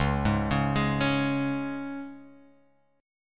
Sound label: Db 5th Db down Guitar Open F; Db5 arpeggiated; JGuitar